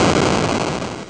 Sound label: Explosion; Classic800XL